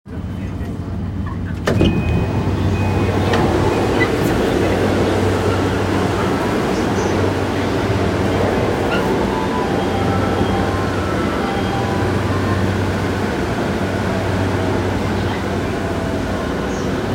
JR山手線鶯谷CCW

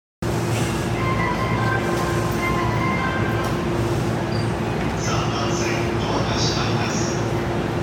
埼京線渋谷北, train sounds

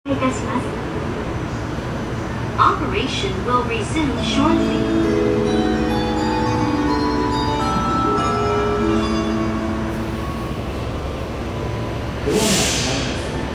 南北線白金高輪北, train sounds

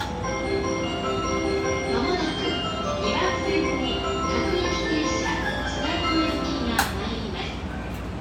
JR中央・総武線船橋西, train sounds

